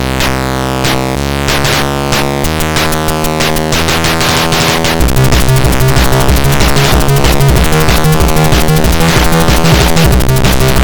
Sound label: micromusic radio Jingle